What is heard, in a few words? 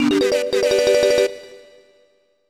Running Shoes Jingle; Banjo Kazooie